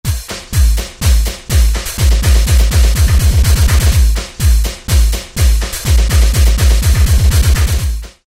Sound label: Goa Trance Drums Fill In, Roland MC-303